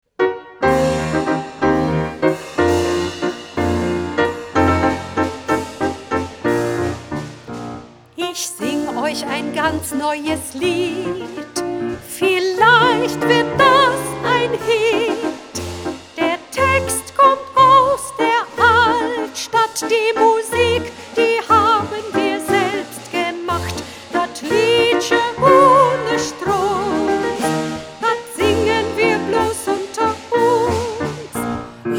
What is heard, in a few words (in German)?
mit Gesang; Jloevste